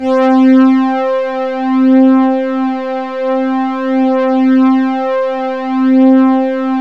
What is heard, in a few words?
C3, ANALO STRING; Sound Gallery - Charged